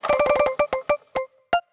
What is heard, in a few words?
Color Changer Rotate; Ellen's Garden Restoration; android